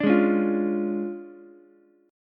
D Minor Major 7th Flat 5th Ukulele, chord; DmM7b5; strummed; JGuitar

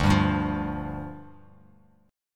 D-Minor Double Flat 5th, D, Bass Standard; Dmbb5; strummed; JGuitar

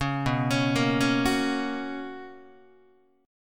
B Major 7th Suspended 2nd B Guitar; arpeggiated; 4,7,4,8,5,5; 4A,4E,5A,5D,5G#,6C#; JGuitar

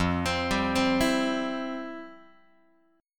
F Major 7th Suspended 2nd, F, down, Guitar

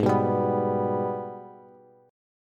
G sharp Minor Major 7th G sharp down Bass; strummed; JGuitar

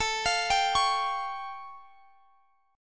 A Augmented 7th A Guitar Drop D; x,x,19,22,20,21

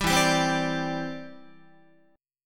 E Minor 7th Sharp 5th E, Guitar; strummed

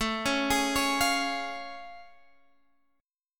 A Augmented Major 7th A, Guitar Half Step Down

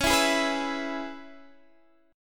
Db Minor 7th Db Guitar Open D

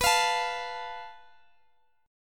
A Suspended 2nd Sharp 5th

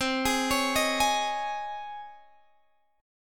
Db Major 7th Suspended 2nd C

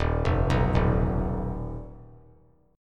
D sharp Suspended 2nd Sharp 5th D sharp; arpeggiated; JGuitar

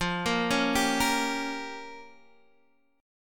Db 6th Flat 5th

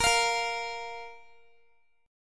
Bb 5th Bb Guitar; Bb5 strummed

